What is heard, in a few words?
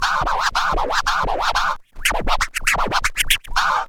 SCRATCH SEQUENCES; SCRACTH; Megabass Remix! 2